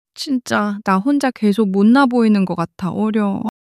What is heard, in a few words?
crying